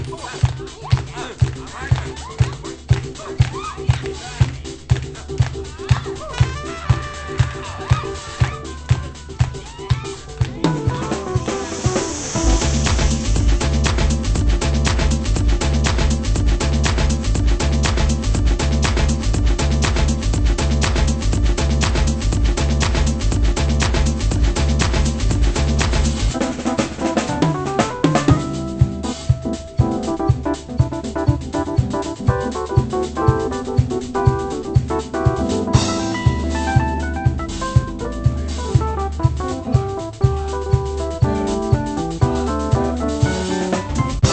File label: techno